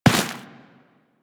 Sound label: Run Dirt, Footsteps; Warframe; Teshin